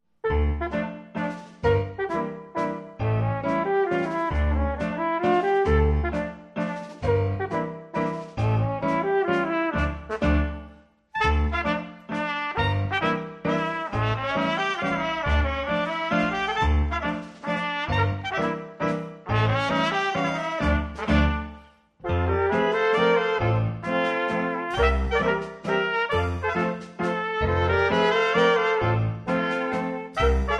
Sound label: Polka Mazurka; Living Stereo